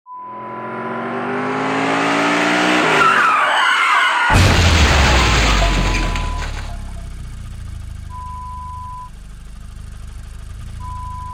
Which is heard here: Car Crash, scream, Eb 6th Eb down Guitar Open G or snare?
Car Crash